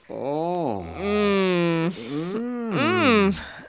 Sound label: people